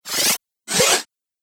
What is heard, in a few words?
2 Sfx Quick Swishes, Whoosh; Andrew Fleming